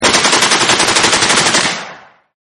BAR Burst, Browning Automatic Rifle Burst Shot-Close Miked; Rifles; Guns